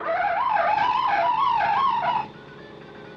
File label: shipboard Sound powered phone